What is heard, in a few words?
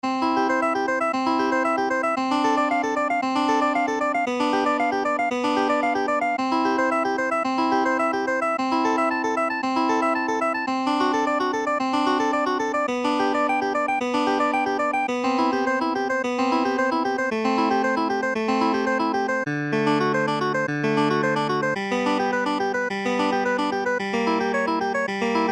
electric piano vsti hohner clavinet d6; lectrikeys; the well tempered clavier book i bwv 846